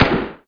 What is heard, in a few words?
M4 one; SpecOps2MPlayerDemo